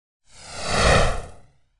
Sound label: Whoosh Short Jet; Hollywood Edge; sound designer's toolkit v2